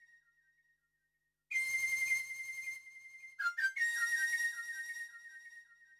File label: flute, ethno, 160, C